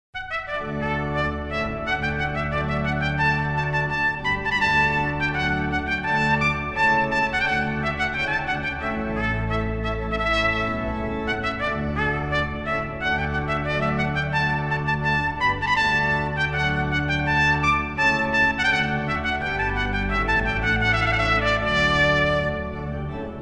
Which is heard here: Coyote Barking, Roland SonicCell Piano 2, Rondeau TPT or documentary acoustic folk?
Rondeau TPT